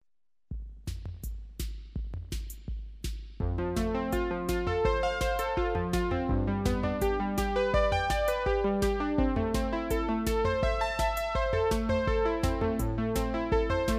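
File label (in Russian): мелодия на синтезаторе с ударными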